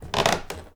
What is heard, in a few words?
CREAK Wood Hollow; mono; Universal Sound FX